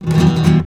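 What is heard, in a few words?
RASGAND F minor, FLAMENCO Guitars; Spectrasonics; Hans Zimmer Guitars VOL-2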